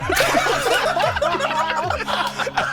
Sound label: laugh track